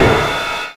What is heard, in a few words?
ride, trash kit; USB Soundscan vol.11; Drums Dance & Groove; AKAI